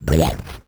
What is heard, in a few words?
slime attack; Crypt of the NecroDancer